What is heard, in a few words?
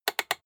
rotary control short quick turn hard